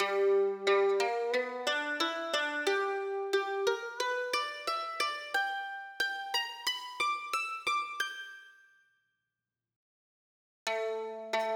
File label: Mando Major Pentatonic